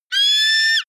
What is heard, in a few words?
eagle call